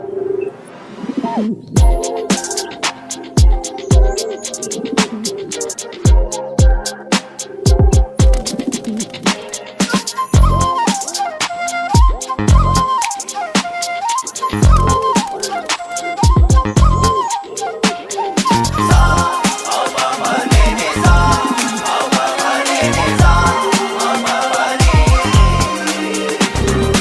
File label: FLUTE BGM RINGTONE, MALAYALAM; PREMALU